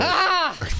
Scream

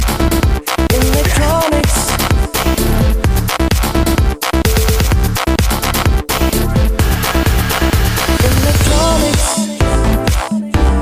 Electronics

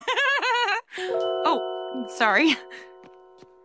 Melody Laugh

